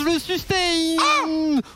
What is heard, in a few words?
sustain ahh